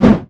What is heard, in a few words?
Axe swing; 100rogues